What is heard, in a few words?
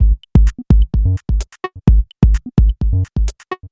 Tech Loop